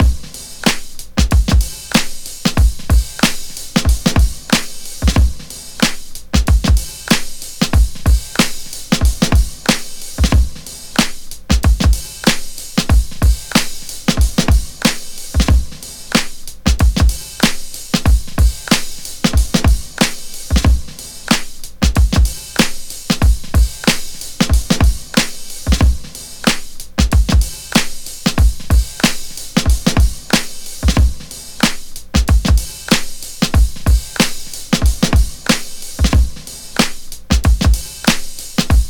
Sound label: 93 Tightloose, breaks; Lockdown Breaks